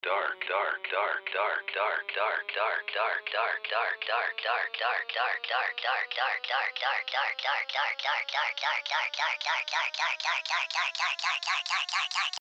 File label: pitch rise